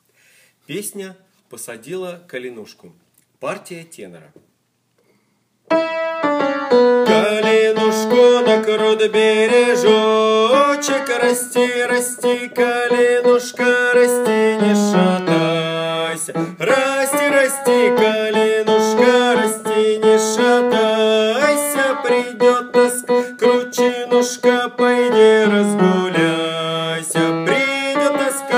Tenor; Posadila Kalinushku

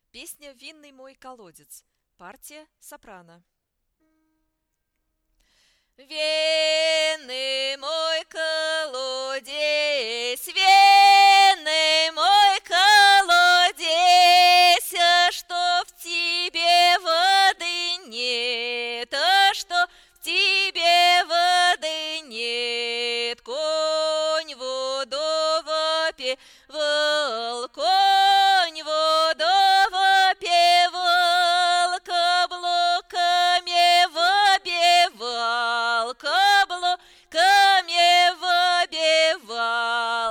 vinn sop, ruschoir

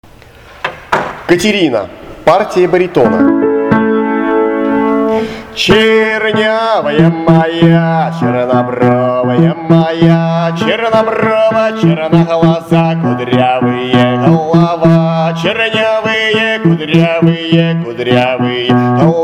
baritone

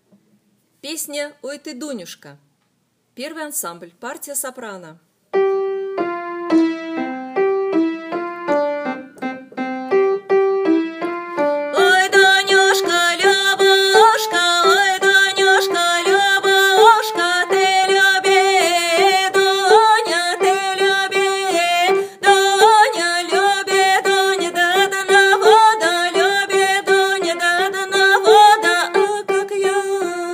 Soprano; Oy Ty Duniushka

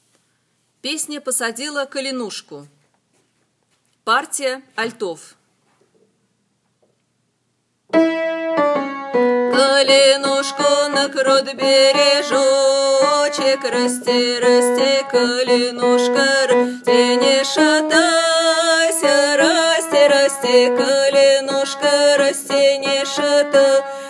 Alto; Posadila Kalinushku

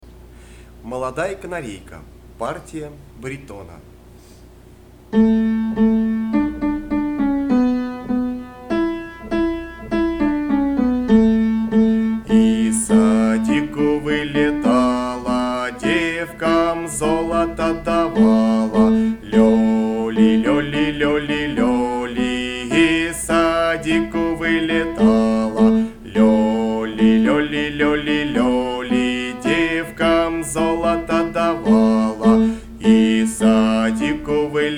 baritone; molodaya kanareika

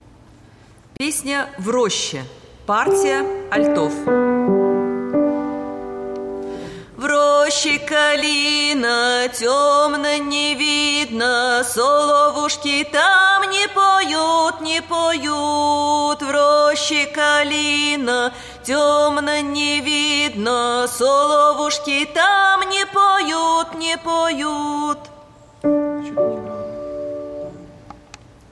alto

